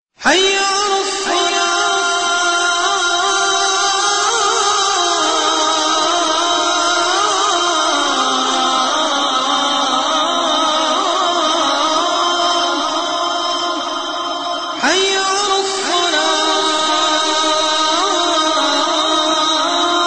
Azan

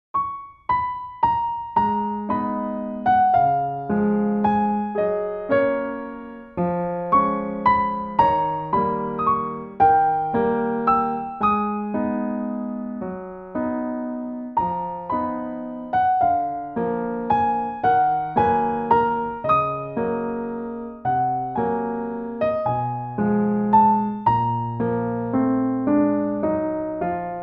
Waltz

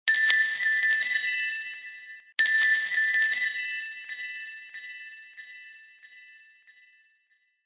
Ring Ring

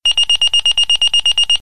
Fire Pager Alert; Bee

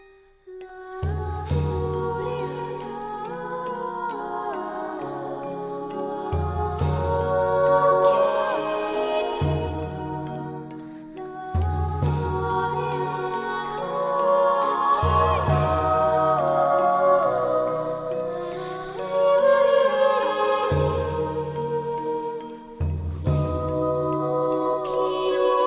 Instrumen

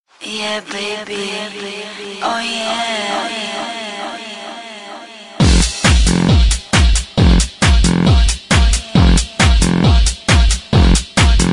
Oh Yeah Techno

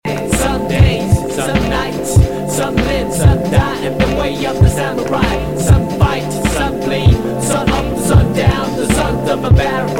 BATTLECRY

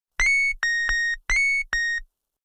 Cute tone

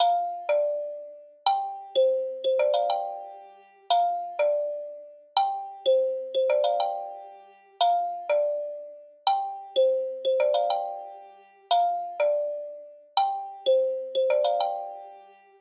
Door Bell

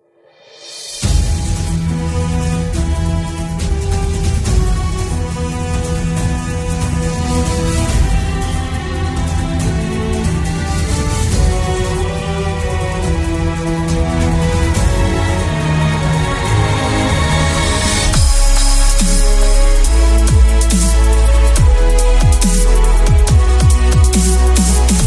remix

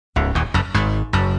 Notification; Top Gun

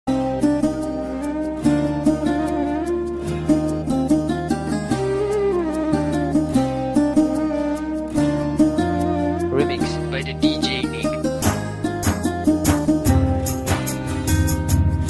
Punjabi

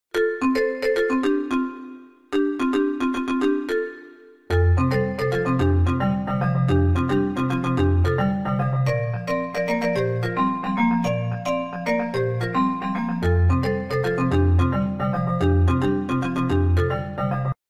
Melody

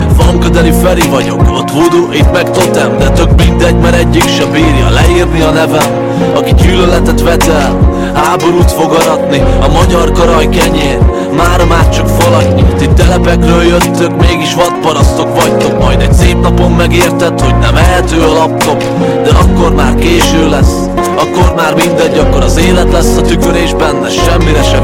Hungarian rap

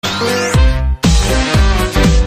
Electro Swing

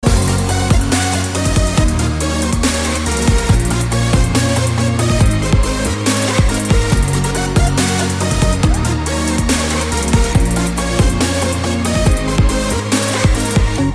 Crazy Beat

